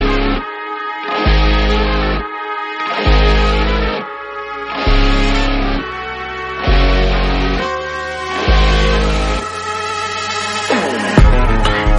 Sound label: Theme, Tamil; ringtone; Petta; Rajnikanth